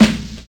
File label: bassy snare g sharp key; snare drum samples